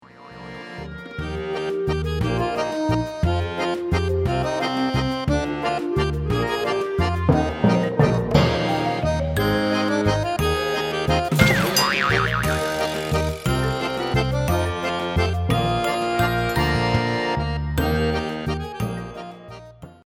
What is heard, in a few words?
Theme Song Instrumental; Catastrophe Kitchen